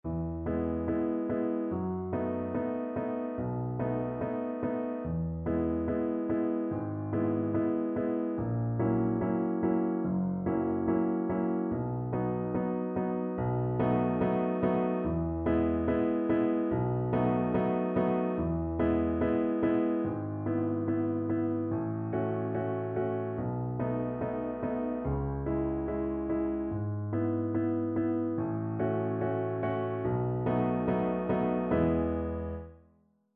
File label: low voice accompaniment; Grade 4; Introduction to Runs